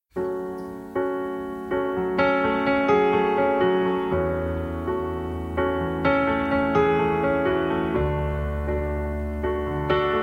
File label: Acoustic